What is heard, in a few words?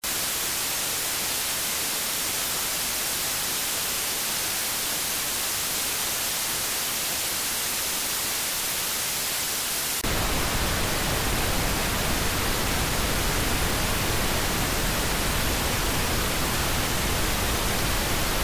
Noises